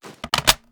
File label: reload empty mag in; gmod